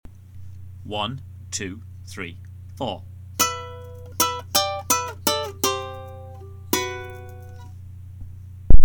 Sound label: riff, ukulele